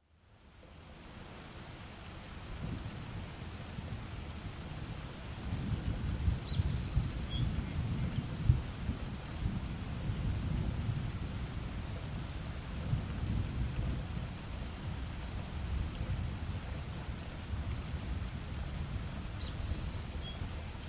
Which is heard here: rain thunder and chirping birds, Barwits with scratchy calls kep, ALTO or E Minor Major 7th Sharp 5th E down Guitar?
rain thunder and chirping birds